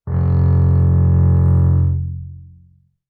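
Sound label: Bass arco fortissimo sul E F1, Double Bass; MIS Pitches - 2014; Strings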